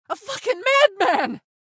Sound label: town human far; Blind Drive